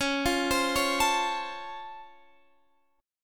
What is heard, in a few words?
Db Augmented Major 7th, Guitar, Standard; arpeggiated